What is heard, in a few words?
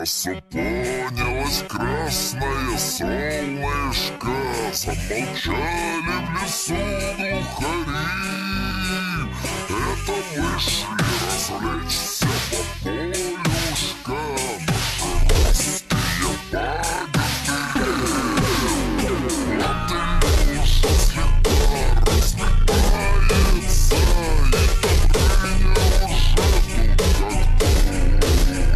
hard phonk slowed